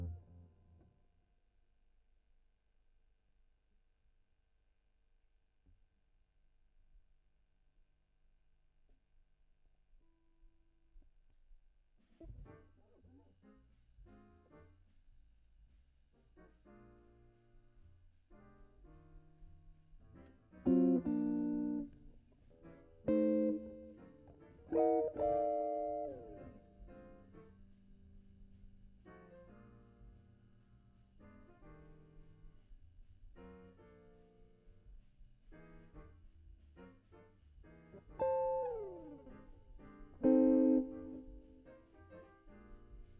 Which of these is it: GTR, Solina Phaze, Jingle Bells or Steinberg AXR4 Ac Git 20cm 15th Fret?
GTR